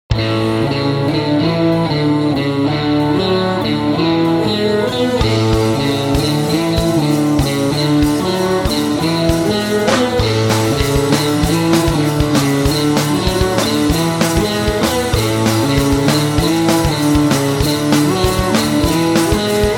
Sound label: Jingle